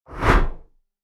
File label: swoosh